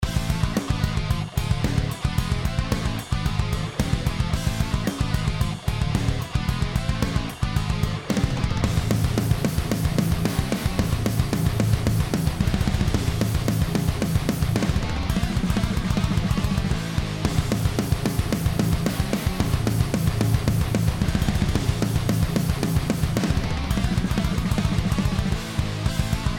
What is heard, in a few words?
Deathcore